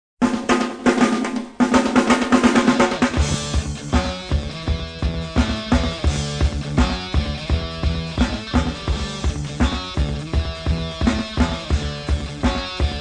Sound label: Reggae